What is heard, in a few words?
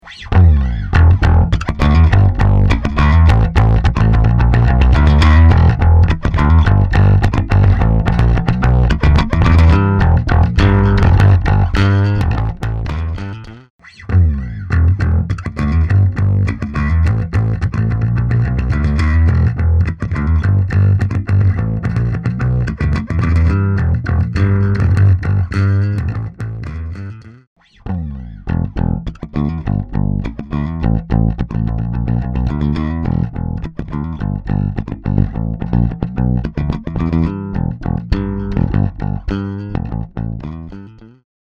Ampeg PF J Bass Pick